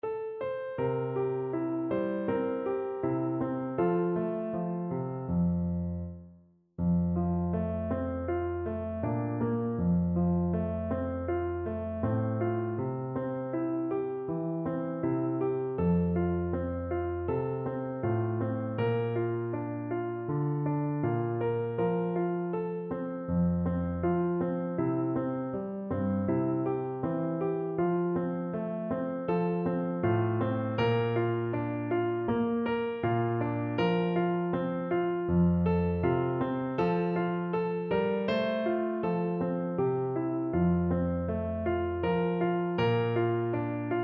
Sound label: Clarinet